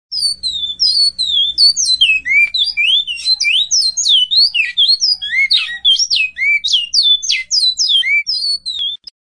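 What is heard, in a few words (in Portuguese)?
ringtone bicudo flauta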